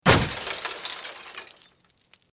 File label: Car Window Smash